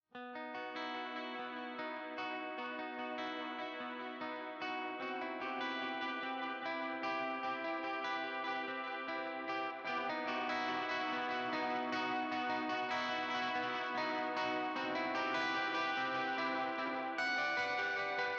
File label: gtrs